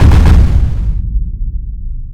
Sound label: Explode